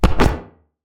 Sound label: footstep; Barotrauma; Barotraumatic; Crook